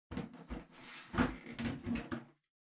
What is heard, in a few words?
Ceiling Light Far Cover Remove